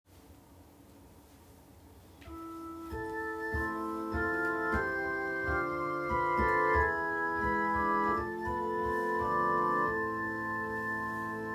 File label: bass, cor jesu